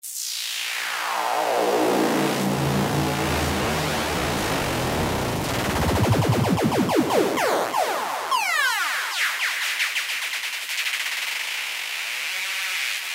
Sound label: S.E.-HIT DEMO, Korg microKORG; Factory Demo Songs